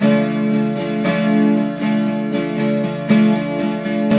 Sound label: 12 STR MIN D#, TWELV; Spectrasonics; Hans Zimmer Guitars VOL-1